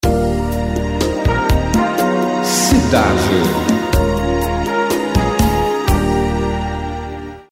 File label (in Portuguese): Vinheta; Cidade FM